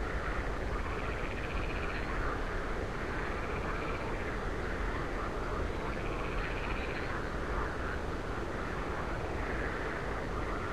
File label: marsh bkg; ambient; background